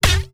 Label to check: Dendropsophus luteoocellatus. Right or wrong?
Wrong